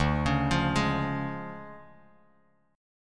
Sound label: D Minor Major 7th Flat 5th D Bass Standard; 10,16,15,13; DmM7b5 arpeggiated